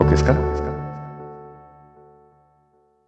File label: Retry Jingle; Friday Night Funkin' Konbawa OST mod Windows gamerip 2022